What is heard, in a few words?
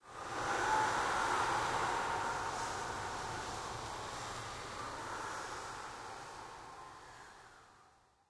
red deep jungle